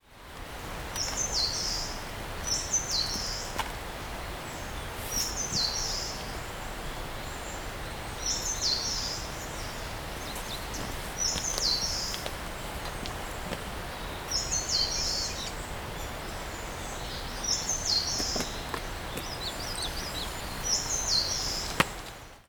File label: sendaimusikui, bird song